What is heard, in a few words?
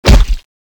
hit; weapons